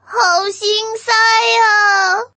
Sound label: fail; fight Result